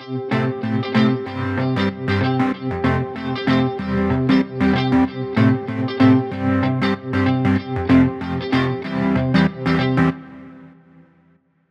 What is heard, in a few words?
synth loop 95 Amin; CAPTAIN CUTS